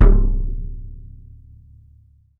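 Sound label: RESONATING KICK; 3D Drums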